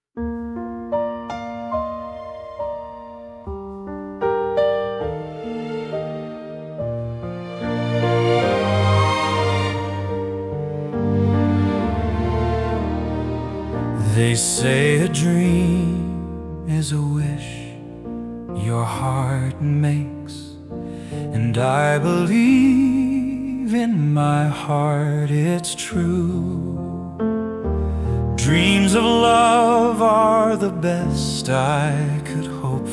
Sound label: A Perfect Dream MALE